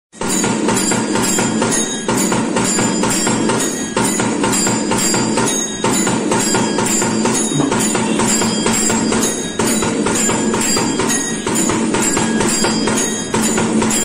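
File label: bhakti background music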